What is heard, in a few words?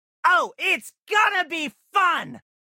whahhsahha